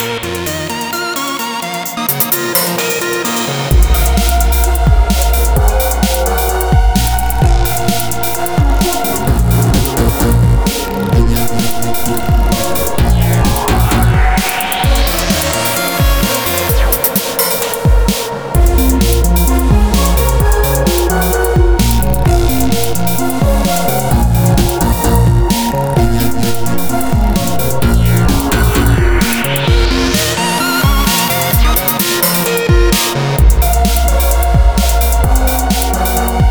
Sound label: Space-ish tune with note scales and monster growls; Both, battle and prebattle versions; skull lantern